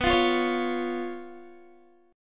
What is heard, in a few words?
B Suspended 2nd Flat 5th, Guitar; strummed; 4F,4A,5C,5G,5C,6E; 18,20,17,18,17,x